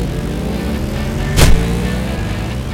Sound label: smash